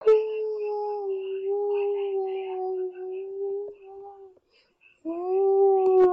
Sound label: whale